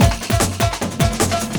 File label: LOOP, 150 BPM